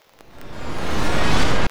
SCRATCHER, Trance Fusion, STOP SHORT; ILIO

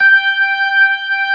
B3 G 4, ORGAN; AKAI S-Series CD-ROM Sound Library VOL-8

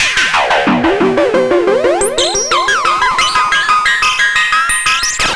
Serge, Synths Arpeggios; Electronic Point Blank

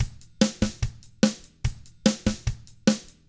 drums, 50s rock; ILIO; Double Platinum Drums 1

